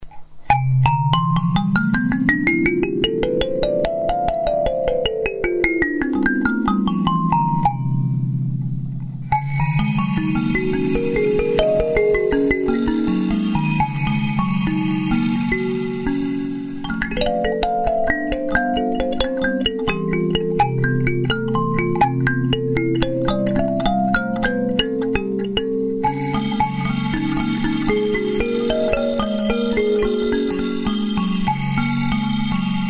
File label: kalimba